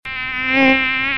Moustique

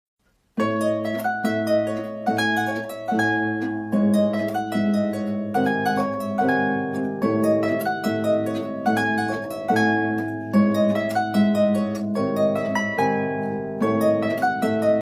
Harpe; instrumental

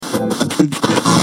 Recherche Radio

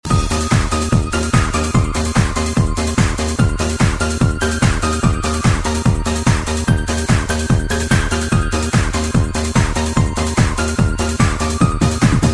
Sonnerie de Noël; fetes

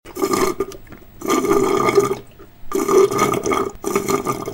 Boisson

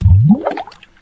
Prout dans l'eau

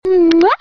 Mouwaaw

